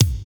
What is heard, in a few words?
KIK BRYT, Dance Kicks; Roland L-CDX-01